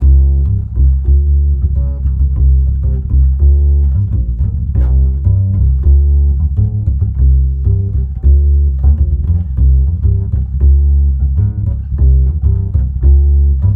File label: Upright Bass Wet